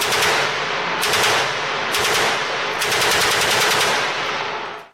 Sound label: UMP45 Heckler Koch; Gunner; Batte-Man